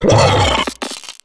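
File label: droid war hit; Star Wars Knights Of The Old Republic I; XBOX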